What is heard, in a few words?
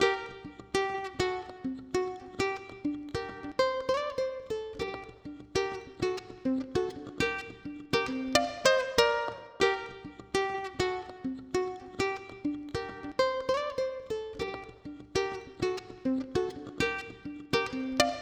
uke